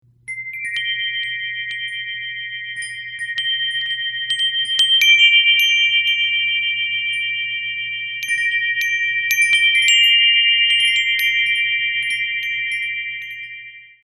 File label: sound, chimes; vindspel; woodstock vindspel; chimes of mercury black 35 cm